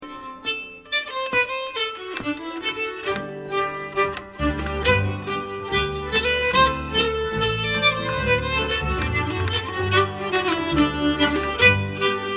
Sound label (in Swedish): Engelska Norge Snoa G